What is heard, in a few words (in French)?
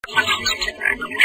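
Fuligule Milouinan, Canards Plongeurs; Fuligule Miorillon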